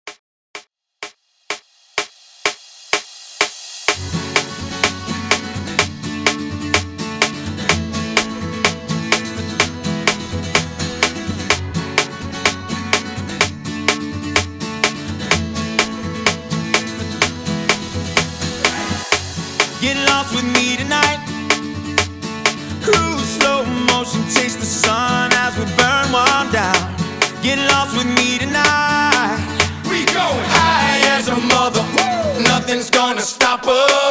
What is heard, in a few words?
Clapapella Dance 126 BPM